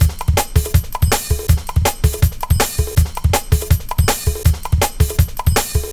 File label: Loops, breaks; m8 breaks